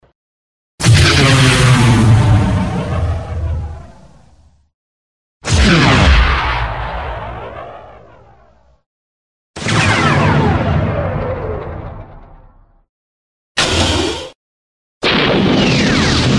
strelba inoplanetyan iz blasternyh pushek

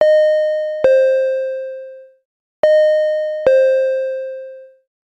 signal vyzova stuardessy

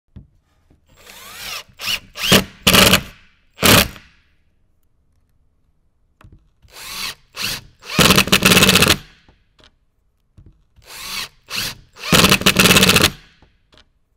shurupovert, zvuk shurupoverta

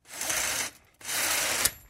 zvuk zhaliuzi

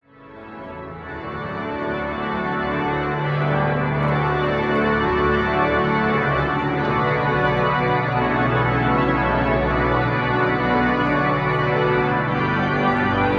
zvuk organa

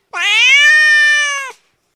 odin myau

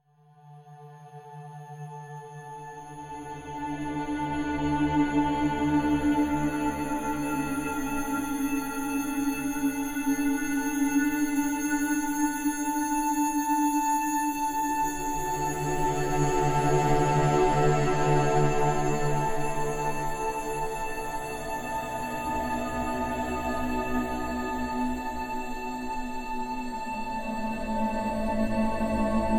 muzyka dlya vsyakih serieznyh scen

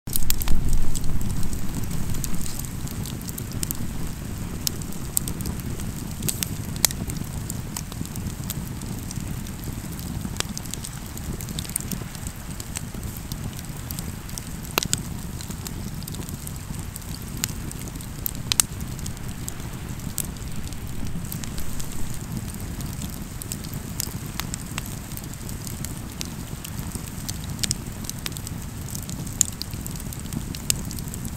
ogon kostra

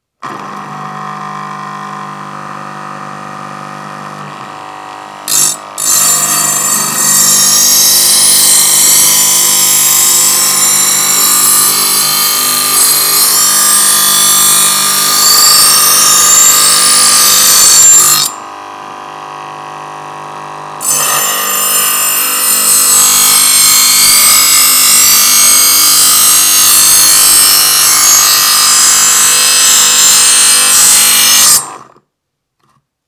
electrotochilka noja, zatochki noja

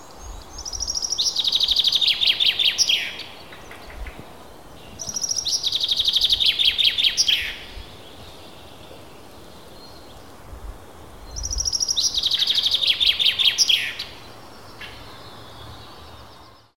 ziablik; Пение и другие звуки птицы зяблика

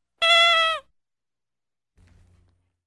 zvuk igrushechnoi kukly

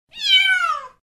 gromkiy myau

